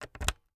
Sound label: Headphone Plug Inserting Into Jack; AdobeCC